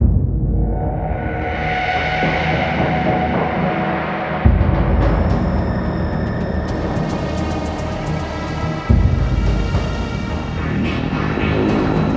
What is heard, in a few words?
Elevator; Far Cry; Research